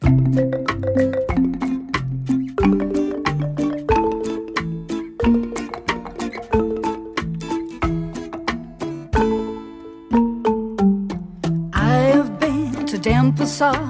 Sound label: Exotic Places Vocal